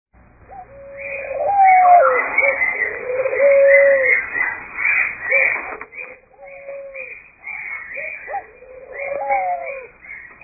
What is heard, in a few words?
Cooing Doves